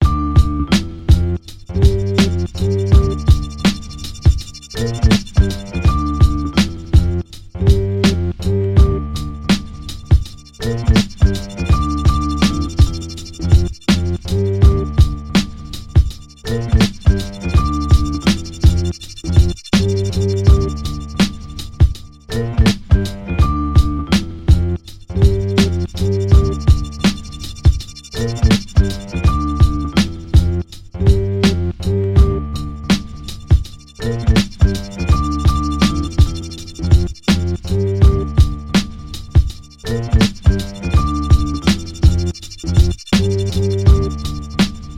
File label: beat